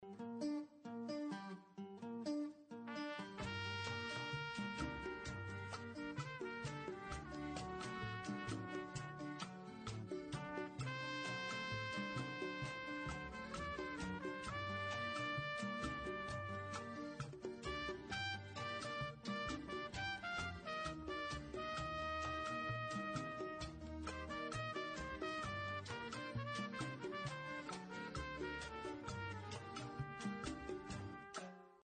cuba bgm

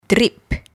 drip

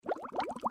bubbles shorter slow

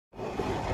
slide wood lid open short

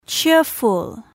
cheerful

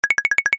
cartoon blink flutter shake; zapsplat

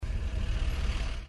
jeep engine

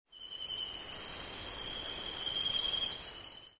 monkee

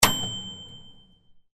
microwave oven bell ding

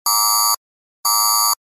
warning beeps

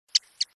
bat

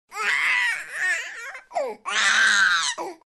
baby 9 months cry

